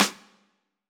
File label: hiphop snare, Effect on